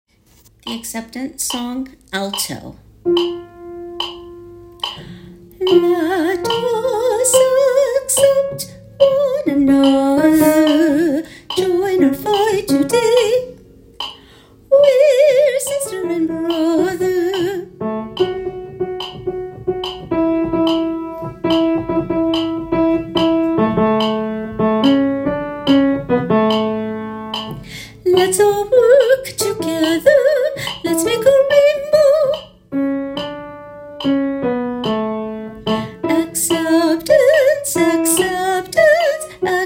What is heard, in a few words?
Alto; Broadway Shabbat